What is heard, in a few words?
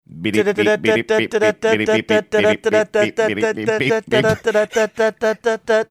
making teletype sounds